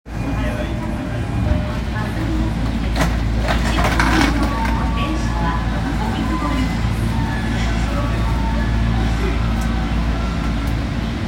丸ノ内線霞ヶ関西

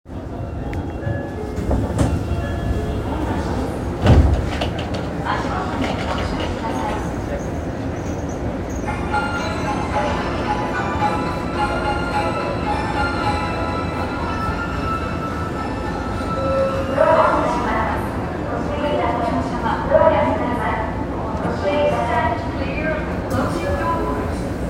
東西線日本橋東, train sounds

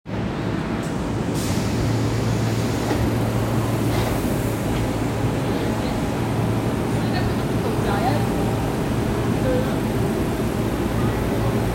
京王線府中東接近, train sounds

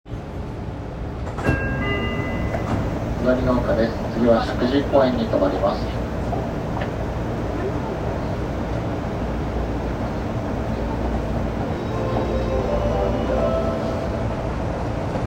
池袋線雲雀ヶ丘東, train sounds; 西武; 西武池袋線雲雀ヶ丘東

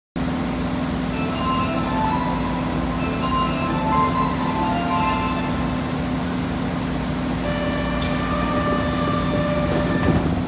京都市営地下鉄東西線発車音東, train sounds

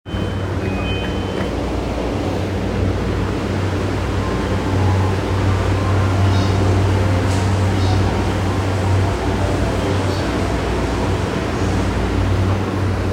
JR横須賀線西大井北, train sounds

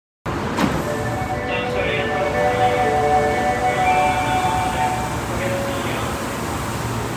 発車音, train sounds; 大阪メトロ四つ橋線南

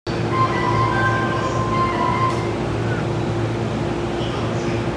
埼京線中浦和北, train sounds

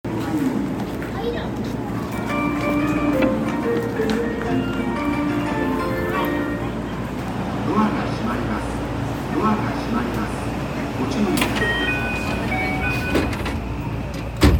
大正発車, train sounds

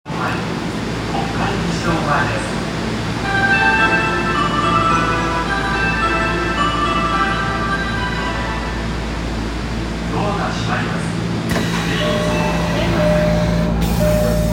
丸ノ内線国会議事堂前東, train sounds

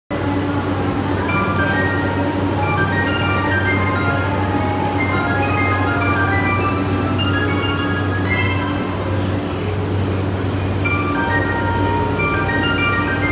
JR山手線新宿CW

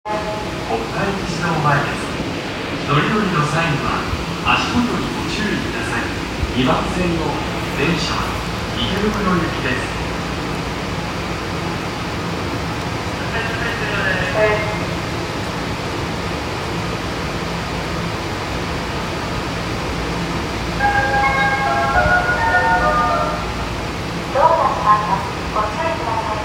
丸ノ内線国会議事堂前東, train sounds

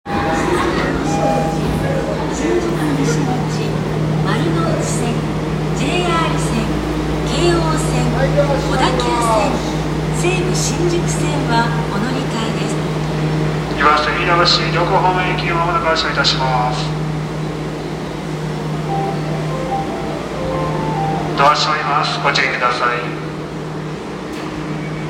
大江戸線新宿西口, train sounds; 都営; 都営大江戸線新宿西口

